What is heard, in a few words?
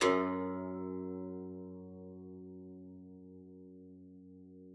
KCYM F#2, KLAVICYMB; Xsample VOL-8